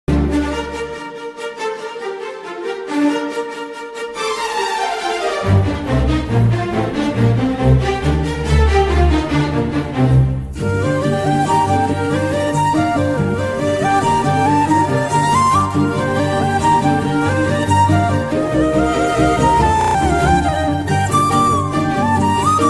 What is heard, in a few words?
love bgm ringtone, bgm beat; maha samudram trailer